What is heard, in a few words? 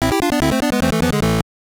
Jingle, gb; Sakata Gorou Kudan no Renju Kyoushitsu; GBC